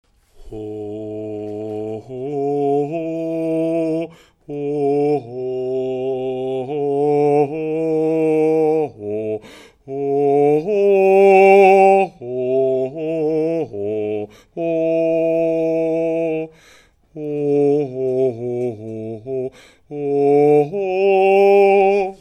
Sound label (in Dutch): bas; Sjlof majn kind (bas); Oefenenen; Jiddish ensemble TOCH